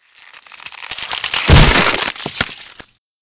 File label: grunt explode